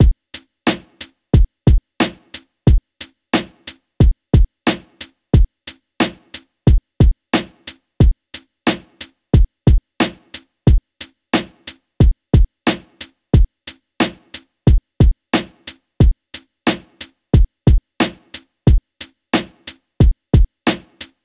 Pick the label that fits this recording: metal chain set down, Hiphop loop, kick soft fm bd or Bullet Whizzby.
Hiphop loop